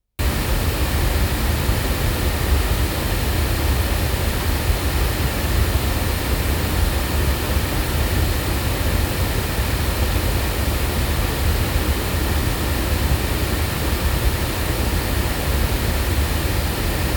Oktava ML53 loudspeakers

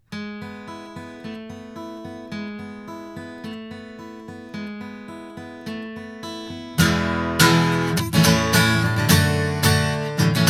Blue Encore200 guitar

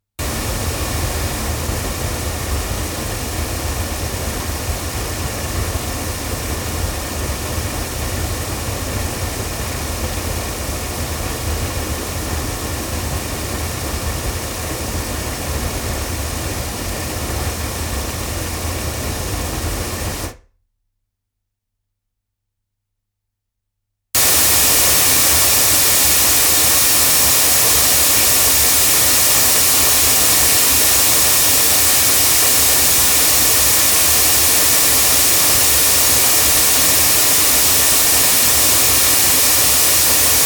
Neumann TLM170 cardioid loudspeakers

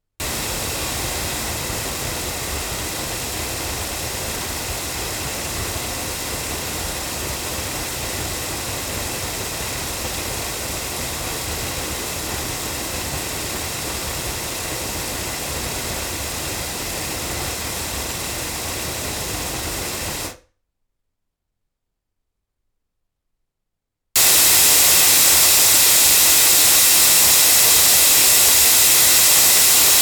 loudspeakers; ADK A48

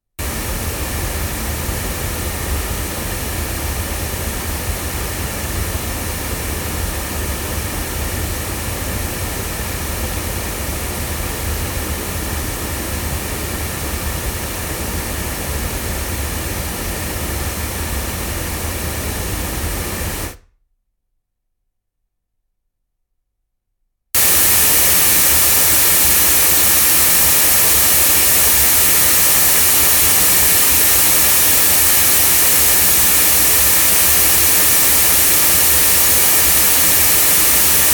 Manley Reference cardioid loudspeakers

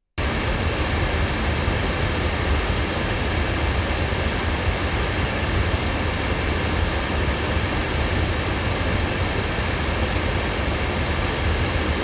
loudspeakers; SM6i cardioid; LineAudio

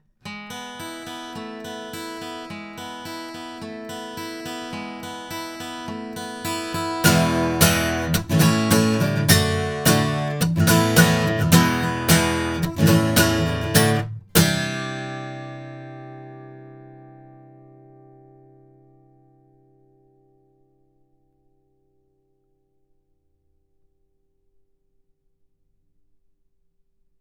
MXL 1006BP guitar

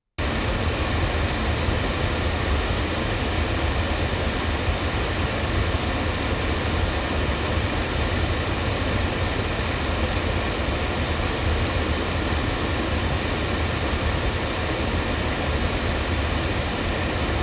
MXL 600 loudspeakers

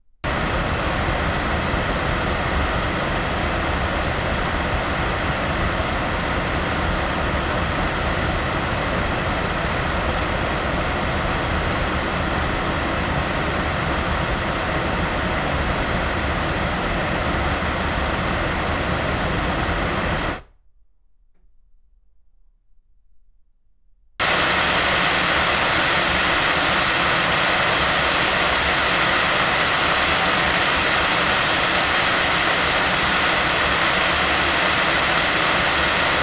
ELM-C loudspeakers, microphones recordings; Pearl

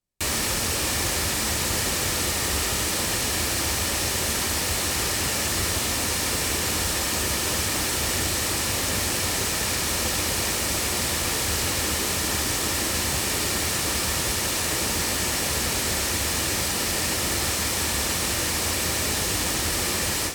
Rode NT3 loudspeakers

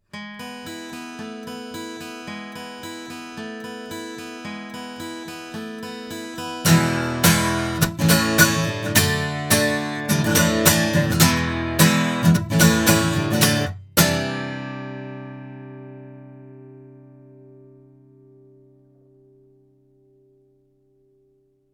Generis GC1 guitar

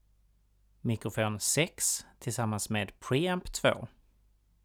mic pre

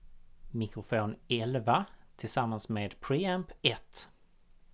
mic pre

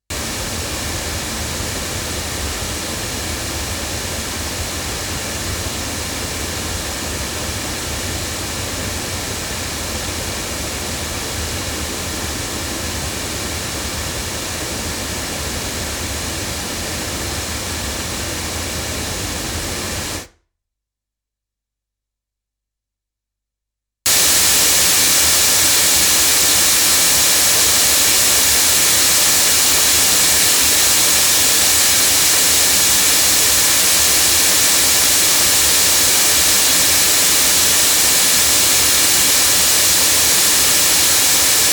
Milab LSR-1000 loudspeakers

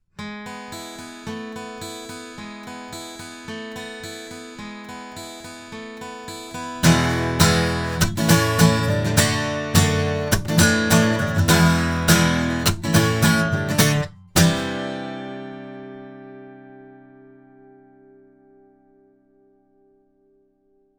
Avant CV-28 hypercardioid guitar

